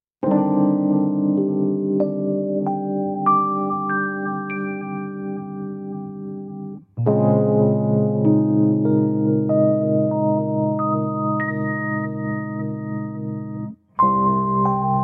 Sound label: Rhodes (Stem)